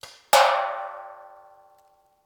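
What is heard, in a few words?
Paintball Gun Impact; Sound Ideas; Guns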